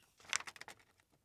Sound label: Brooklyn Found Sound Turning Pages